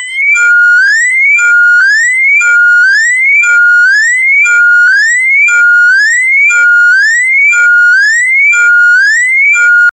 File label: danger alarm, warning